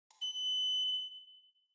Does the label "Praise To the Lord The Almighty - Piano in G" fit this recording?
No